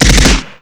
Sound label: klobb fire, weapons